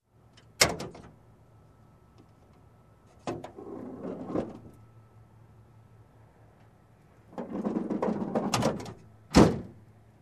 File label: Engine Hood Opening and Close